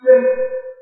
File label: divert; eiffelmedia; brick breaker